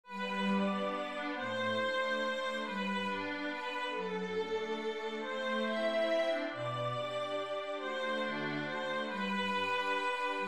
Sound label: waltz full